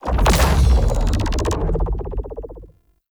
Hammer Shockwave Pulse; Ultimate Abilities; Unairu; Warframe; Focus

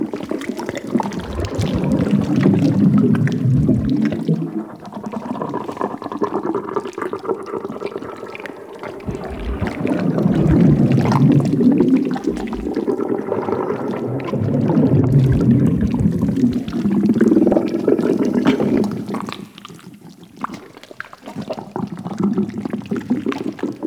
Infested Microplanet Cyst Loop Large; Props; Warframe; Infested Eris

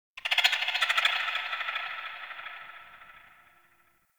Ext Creature Distant Mid; Warframe; Infested Eris

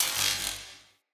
Shock Projectile Zap

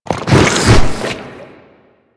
Infested Ancient Strike Flesh; Warframe

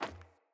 Sandals Run Metal, Footsteps; Player

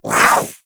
Kavat Vocal Attack Short; Warframe; Warframes; Khora

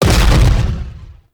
Biped Railgun Explosion; Warframe; Corpus; CorpusRailjack

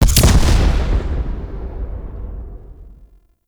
Rocket Launcher Explosion; UT Weapons

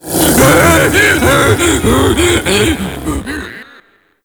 Ape Prelate Capture Scream, Vocals; Warframe; Proto Infested

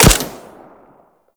Grineer Shield Speeder Rifle Fire; Weapons

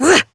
Exert Adult, Melee Swing; en; New War; Adult Operator

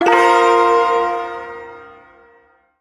Shawzin Blues Chord; Warframe; Duviri Ersatz Shawzin; Chords

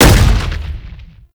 rupture pillar burst; Roathe; Warframe; Tau; Abilities

